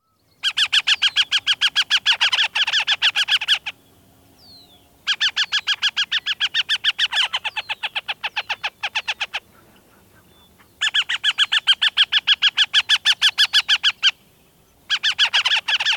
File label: Northern Harrier